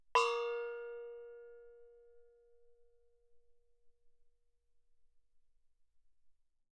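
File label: thai gong Bb4 fortissimo, Percussion gongs & tamtams; thaigongs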